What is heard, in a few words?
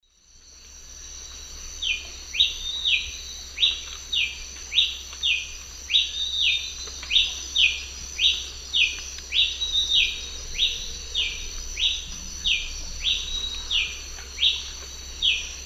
Ramphocelus nigrogularis, song; S. America; Eastern Lowlands